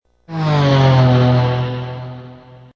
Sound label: Speeding Truck, Sound effect of single truck passing by at high speed.; Vehicles